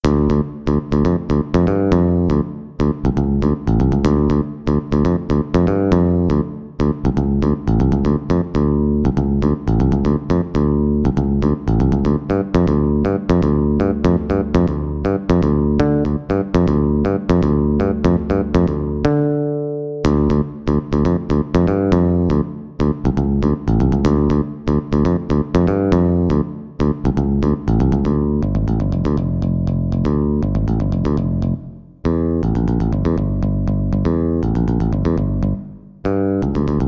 bass guitar

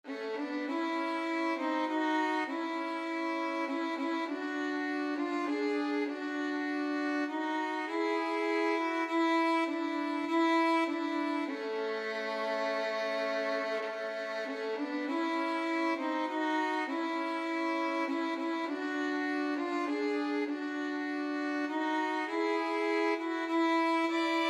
rugged cross, violin duet

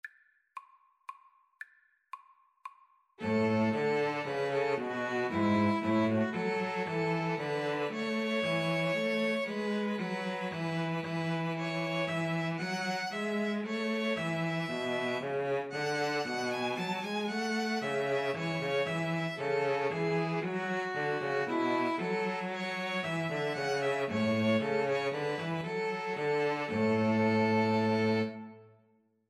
string trio

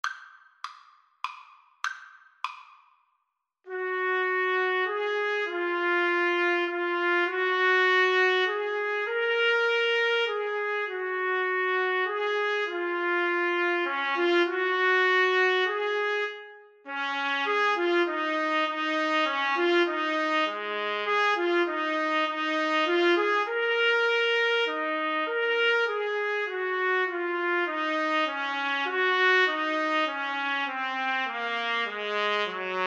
trumpet duet

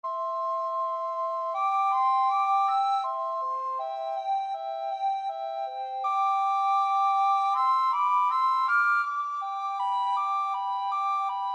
recorder duet

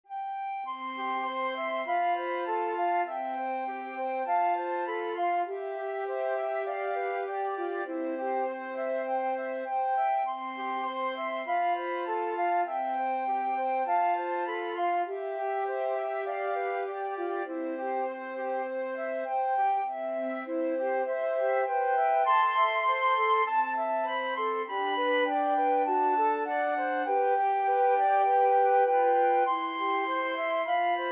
blue bells scotland, flute trio

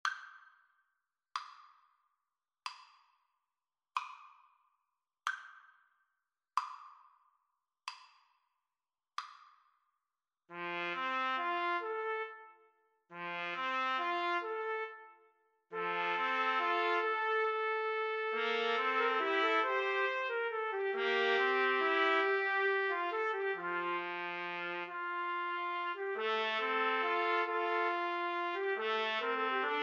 3TPT, Trumpet Trio

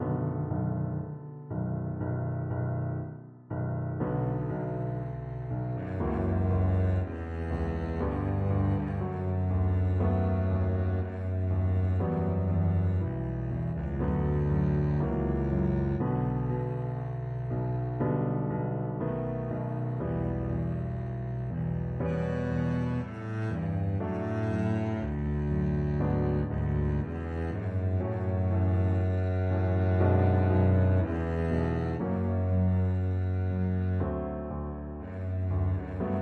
faure libera me, double bass

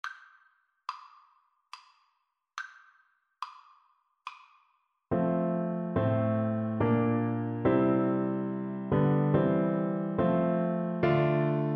god save the queen, Bassoon

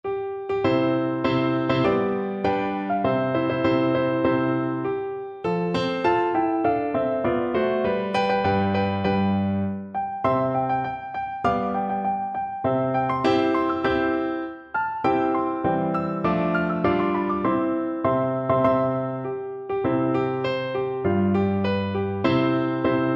columbia FL kar, Flute

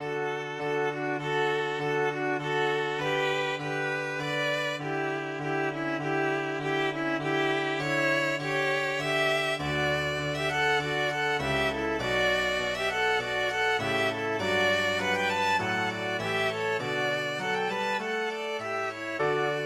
bach sheep, violin duet

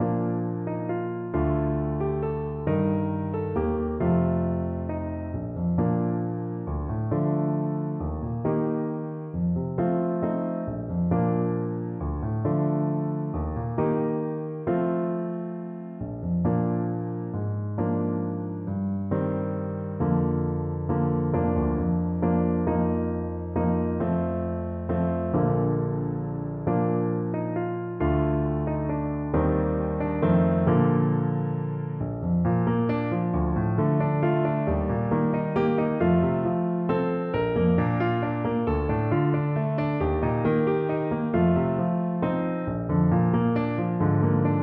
what shall we give, Trumpet

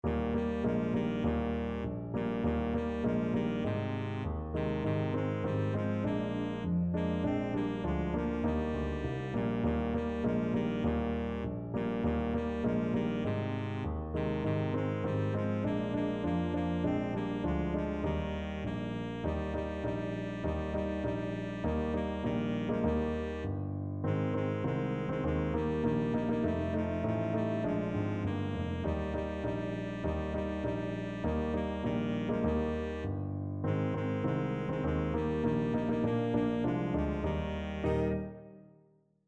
jingle bells, baritone saxophone

